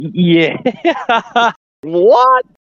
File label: yeahhh hahaha